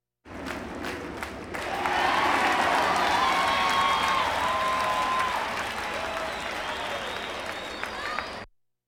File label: Crowds Race cars Baseball